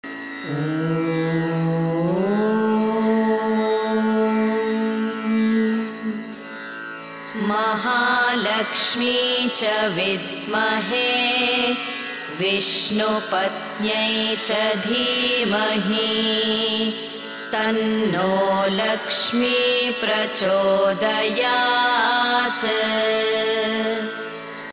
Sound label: Lakshmi Gayatri female